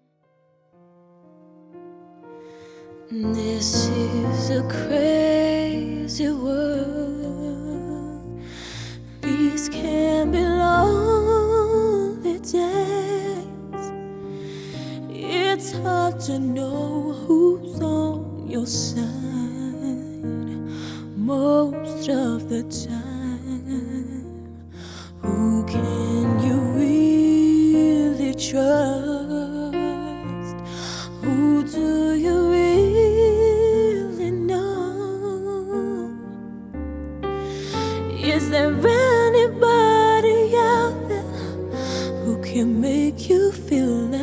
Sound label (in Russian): Прием пения с придыханием